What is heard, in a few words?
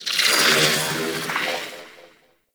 Ship Metallic Robo Chatter; Warframe; Corpus Ship Remaster